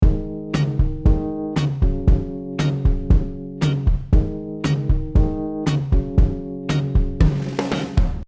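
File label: motown beat, drums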